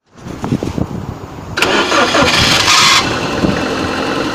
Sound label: starter grind when cold; 1st generation gd 01-08